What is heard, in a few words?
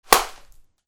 CARTOON